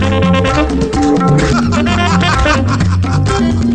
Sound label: RHYTH, LOOPS